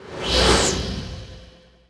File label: teleport; Tony Hawk's Project 8; XBOX